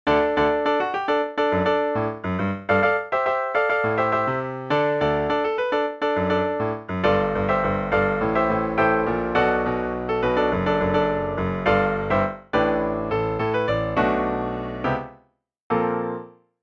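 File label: rehearsal piano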